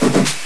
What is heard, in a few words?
Clapping; Madden NFL '96